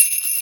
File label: Jingle Bells Perc; Eggnog´& Cocoa